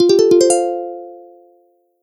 fc gold, flight control; ships flightcontrol

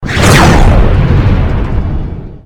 you hit; ships combat; Cosmic Rage

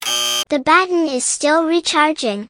stun charge, military

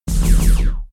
beam; ships; Combat

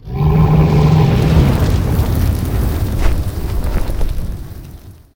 bellow, dragon; combat; creatures

